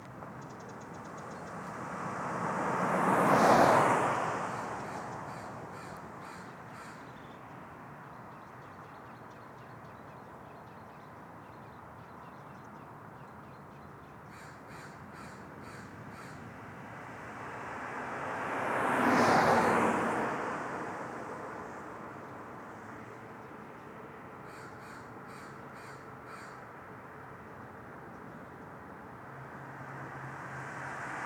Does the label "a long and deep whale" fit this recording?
No